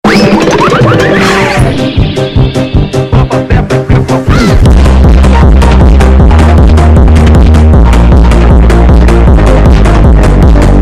sigma phonk

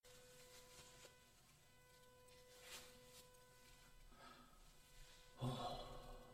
bath sigh; kagami hayato

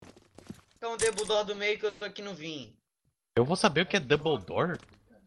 double door

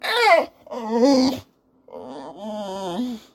glorps

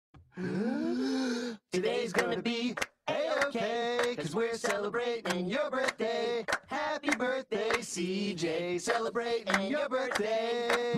happy birthday song; regular show

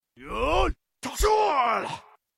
fire breath

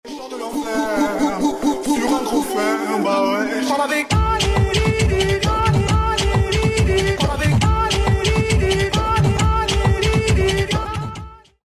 trap

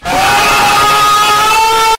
ahhhhhhh scream

